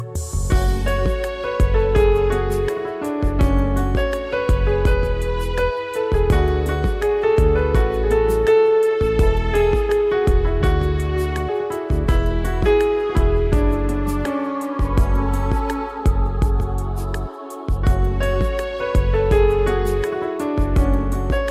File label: saans instrument